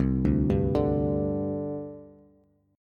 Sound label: C Suspended 2nd, C, down, Bass; Csus2 arpeggiated